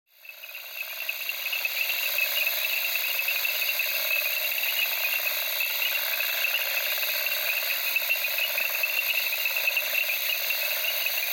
AMB EXT Africa Okavago night crickets frogs in reeds